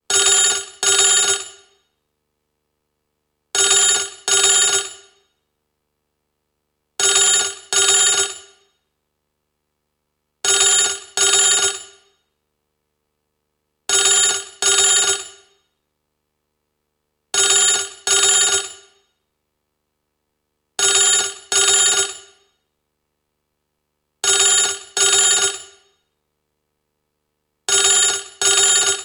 Retro Phone Ring, Old style ringing bell telephone.; Electrical Buttons; Multimedia